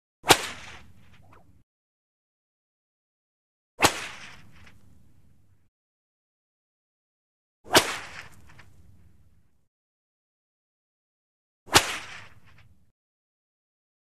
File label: Whip Swish Cracks Single Reverberant Whip Swish & Cr; Hollywood Edge Foley Sound Library